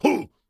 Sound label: Pirate Barrel; gamerip